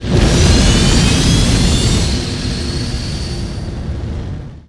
Ship morph

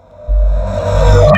Pickup

